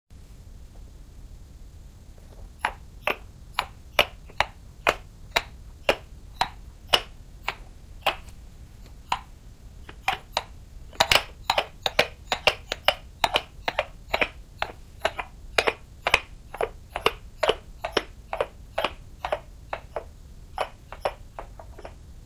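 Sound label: pony hooves clopping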